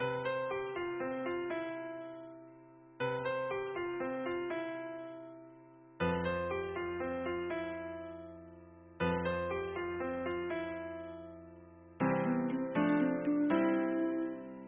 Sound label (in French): Notre Pere instr; louange